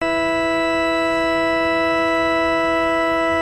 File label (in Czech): principalova mixtura 4 stopa osmirada, englerovy varhany